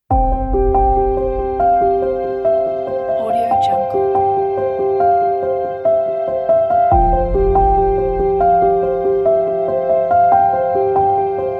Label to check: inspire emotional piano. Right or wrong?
Right